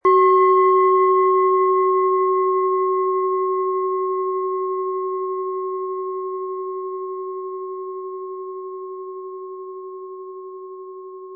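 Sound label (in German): Planetenschale Biorhythmus Geist mit Klöppel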